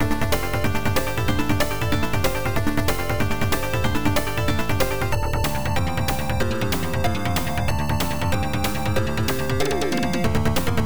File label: Upper Beat; The Scheme; PC-8801; OPN